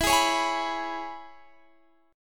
E 6th, down, Guitar, Half Step Down

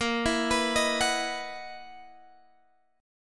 Gb 13th Bb, guitar

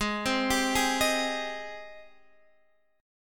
G sharp Major 7th, Guitar, Standard; x,11,10,12,9,11; JGuitar

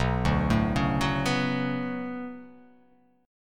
B Minor 9th B, chord

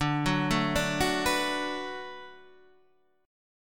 D 6th D down Guitar Half Step Down, chord

